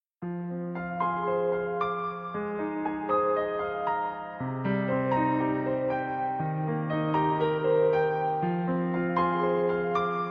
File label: Piano Cover